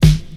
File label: boomy steel snare drum sound g sharp key; Duration 0:01; Samplerate 44100 Hz